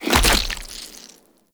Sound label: Land Heavy Goo, footsteps; Warframe; Tenno